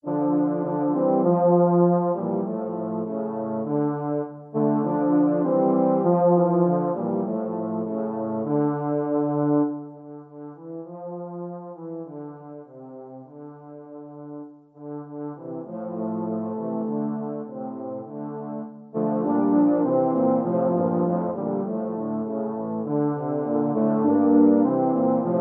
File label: euphonium trio